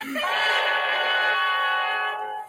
dramatic impact

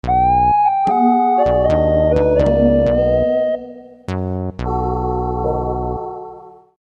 lose

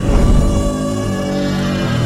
godzilla pain

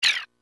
item throw; brawl

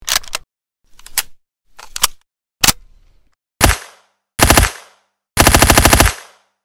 cocking and shooting

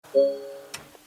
error sound; cayenne 958

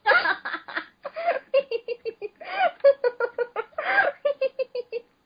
hahahahaha hihihihihihi

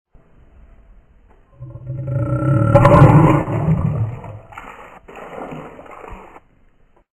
vomit

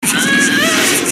groan; ginormica

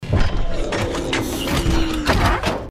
transformation; bumblebees

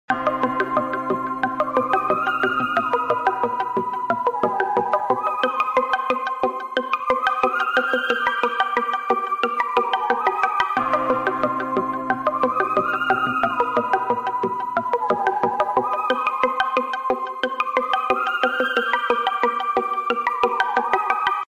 discord halloween ringtone

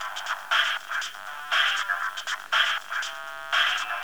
ringtone; gta iv

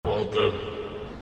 water

